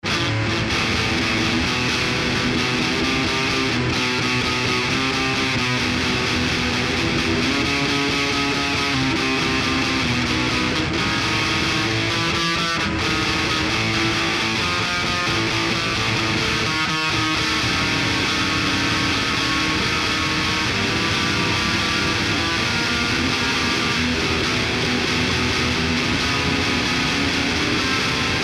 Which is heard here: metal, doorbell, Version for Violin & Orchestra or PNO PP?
metal